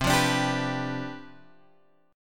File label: C Major 7th Suspended 2nd Suspended 4th C, chord; CM7sus2sus4 Chord {8 8 X 7 8 7} strummed